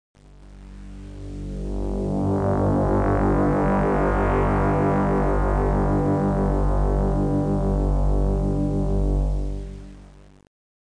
PAD

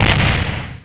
EX SMALL; Macross

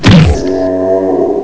HIT GIBS; Carmageddon Demo

